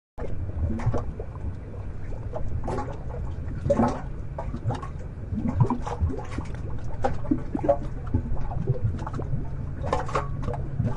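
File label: tar pit big bubbles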